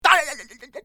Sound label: Pig hit; bad piggies; android; mobile; windows